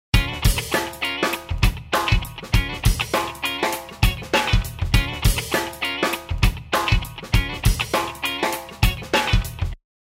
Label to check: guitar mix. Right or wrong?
Right